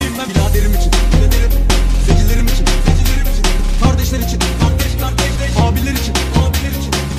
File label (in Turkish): roman havas ritim